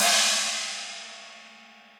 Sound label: China Cop Style, Cymbal; Drums; Drum Hits; Standard Hits; Session Drums Studio